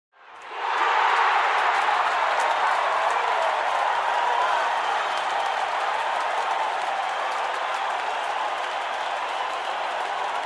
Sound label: People celebrating shouting and clapping